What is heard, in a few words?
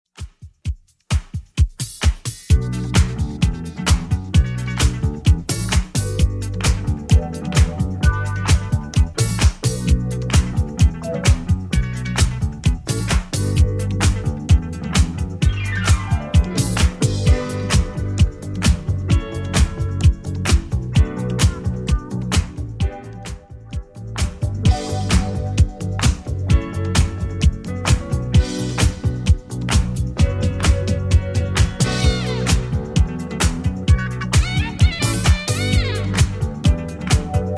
70s Redrum 130bpm